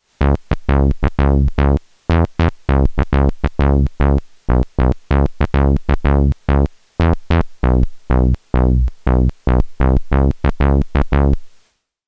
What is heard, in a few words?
Bass